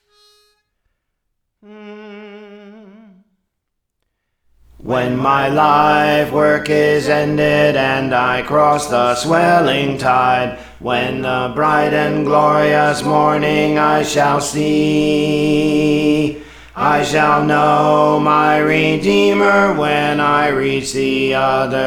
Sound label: Alto; Praise For The Lord Training